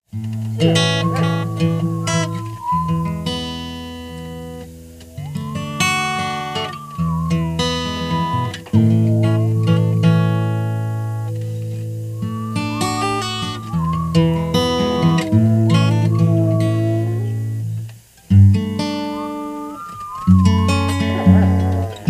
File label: Porch Whistle